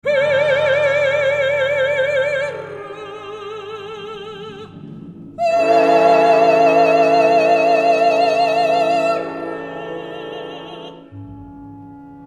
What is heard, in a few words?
Vocal; Rheingold